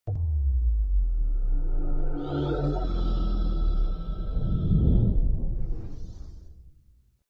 smoke sound effect

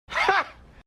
Ha

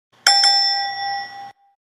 the price is right ding

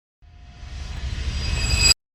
riser sound effect

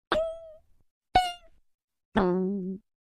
tung ting tong funny sound effect

